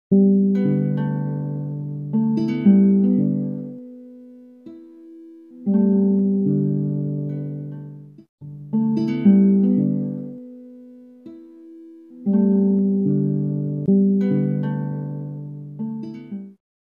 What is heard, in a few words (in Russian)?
aziatsie motivy Ytel gitara; aziatsie motivy tvorcheskaya interpretaciya